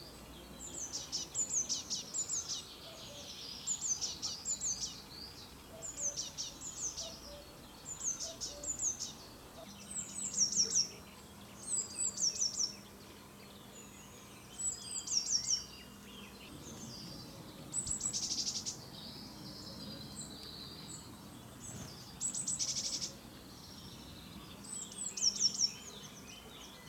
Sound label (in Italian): canto cinciarella Cyanistes parus caeruleus blue tit blaumeise herrerillo; bioacustica